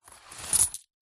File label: foley money coins tip out of paper envelope into hand